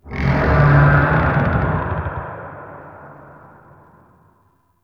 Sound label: Mystical Tree Creak, Wetland Forest Day; Ambience; Midrath